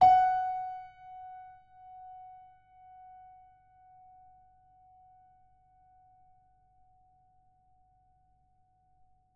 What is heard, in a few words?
F sharp 3